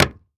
Foley Footstep Hard Sole Dress Shoe Jump On Hollow Wood Surface Quick; Foley Footsteps